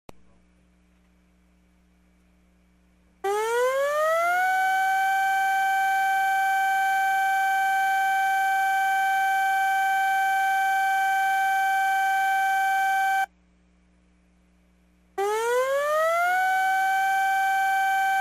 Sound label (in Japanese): tsunami chuuihou siren